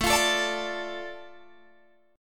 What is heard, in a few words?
A Suspended 4th Sharp 5th, Guitar Open G